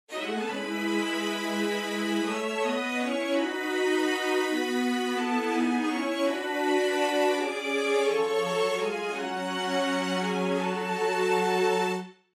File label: strings re-mic